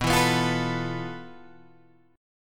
F Major 7th Flat 5th B, guitar chord

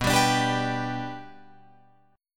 B Minor Sharp 5th, Guitar, Open F; Bm#5 strummed; JGuitar

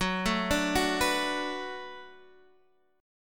B Minor 7th F sharp, chord

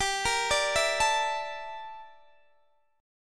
G Minor 6th Add 9th G down Guitar DADGAD

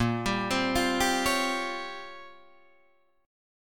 Bb Minor 6th Add 9th Bb down Guitar Open D

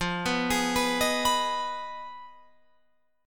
F Minor 7th Flat 5th, Guitar, Open F; Fm7b5; arpeggiated

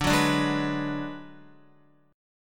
D 6th Flat 5th, Guitar; D6b5 strummed; x,5,6,7,8,7; JGuitar

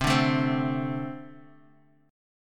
A Minor Double Flat 5th C

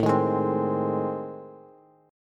A Minor Major 7th Flat 5th, Bass; strummed; AmM7b5; JGuitar

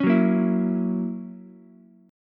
F sharp 7th, Ukulele; strummed; JGuitar

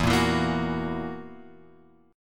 E Suspended 2nd Flat 5th E, chord; Esus2b5 Chord {0 1 2 3 X 2} strummed

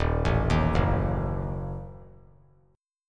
D sharp Diminished D sharp; D#dim arpeggiated; 3,4,6,4; 3C,3F,3A,3D; JGuitar

